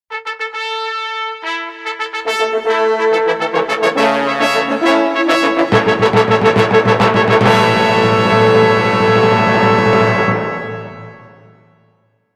fanfare

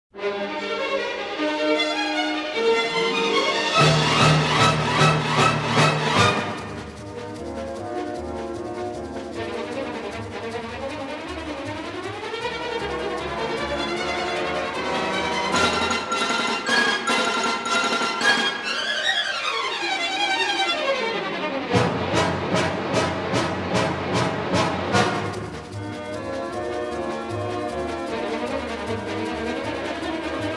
strings

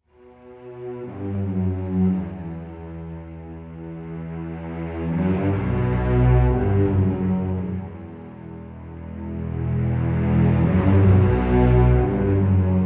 with drums

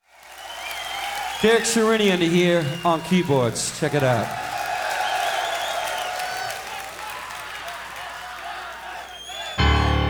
piano solo

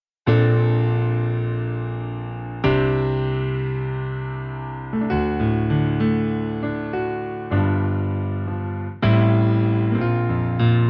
piano solo

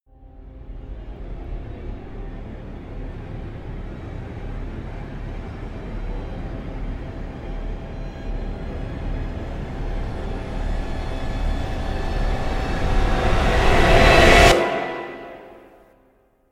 rise; steel claw

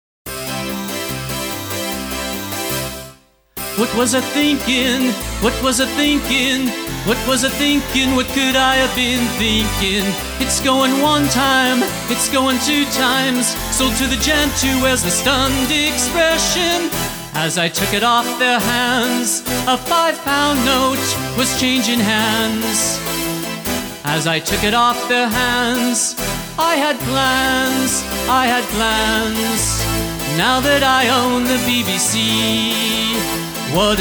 acoustic version; live bbc session

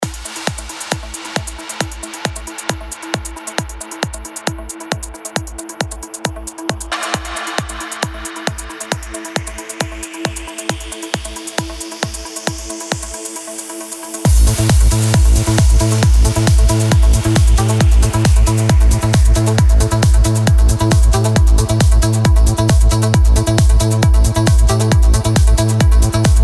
trance version

